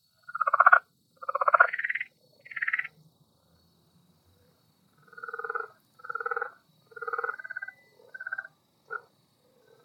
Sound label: ambience insect; smite